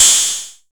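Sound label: MS20 HAT OPEN; INIS - Funky Xtreams; MS-20 KIT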